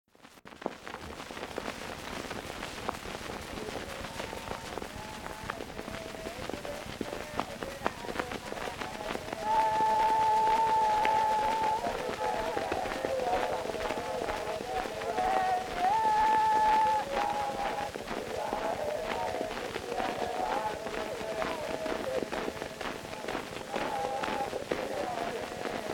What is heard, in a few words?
Tahitian field recordings, Chorus; Edison Blue Amberol; Himene Chorus; UCSB Cylinder Audio Archive